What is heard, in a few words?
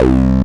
Acid Sounds C, Synths; Vengeance Samples